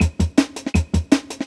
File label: 162 Clave, Jungle Breaks; Original Jungle Breaks